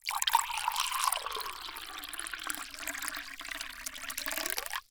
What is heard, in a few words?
Liquid Water Water Pour Into Short Glass